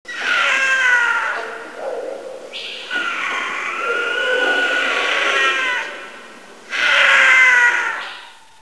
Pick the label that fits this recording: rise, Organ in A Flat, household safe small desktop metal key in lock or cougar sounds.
cougar sounds